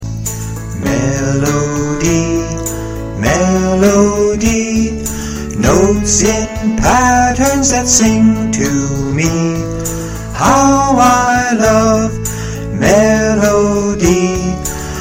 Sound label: melody harmony; music appreciation